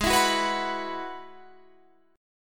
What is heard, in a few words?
G sharp Minor 7th Sharp 5th G sharp down Guitar Standard; strummed; capo=3